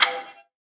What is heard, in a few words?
Clock Tick